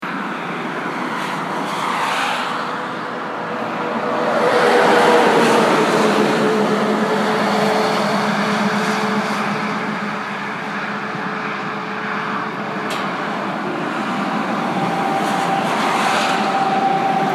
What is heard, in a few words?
cars sound